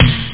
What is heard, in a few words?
STRANGE SNARE